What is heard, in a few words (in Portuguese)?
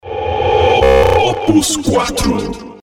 Vinheta; Oppus4